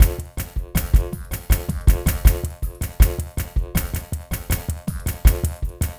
Minimalism, Processed Percussion

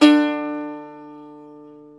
SAZ, Plucks; Eth Plucks, Pluck Duo

